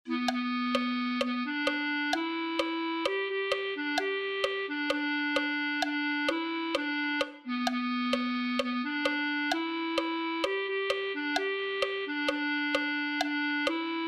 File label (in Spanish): clarinete